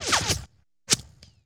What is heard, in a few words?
Bullet Whiz By Multiple; Sony Pictures Sound Effects Series; Ricochets - Hits - Whiz Bys